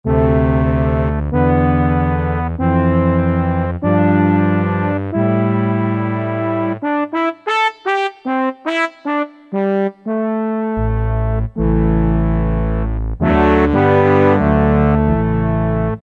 BR Ensembl, Yamaha TG77; Factory Presets

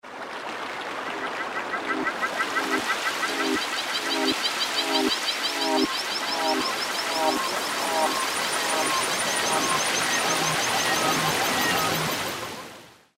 Mount, Yamaha TG33

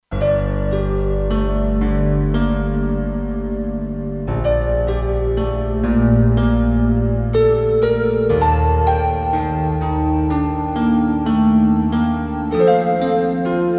Water Piano; Factory Programs

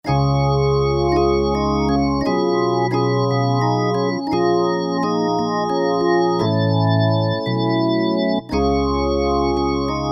Ballad Org., Roland SR-JV80-01 Pop

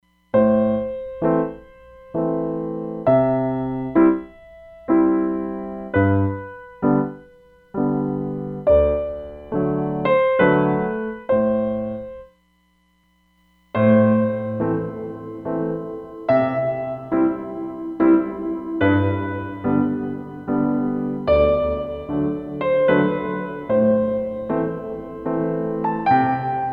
Natural Reverb, Roland DEP-5; Factory Patches

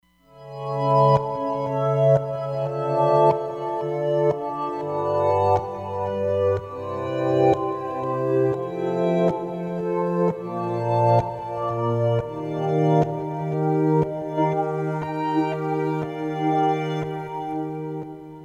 Lunar Dance, Roland Fantom XR

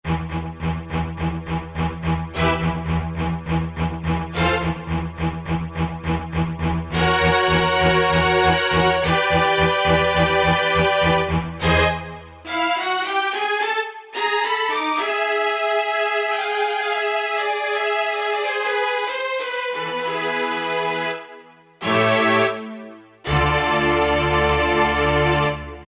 Hall Strings, E-mu Proteus-1; Presets

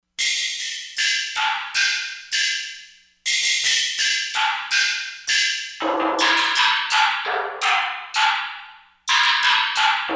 Perc Noise, Korg MSC-07S Synth

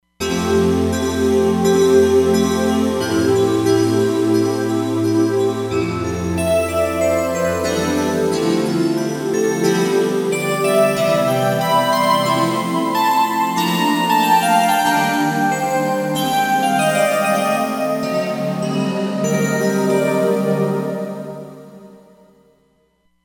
EP Stack

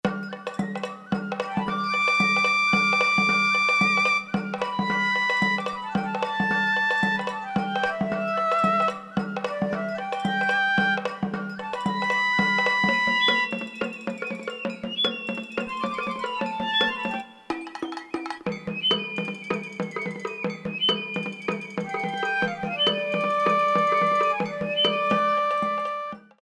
Nippon, Japanese Inst, Korg DSS-1; Japanese Inst 2

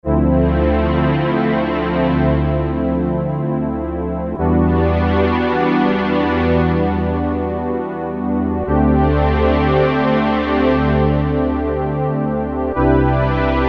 pad dark synth; E-mu Proteus FX; Factory Presets

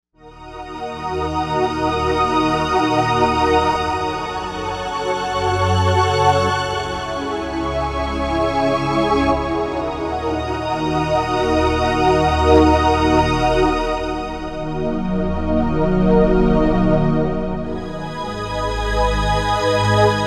ThatChord, Novation UltraNova; Factory Patches

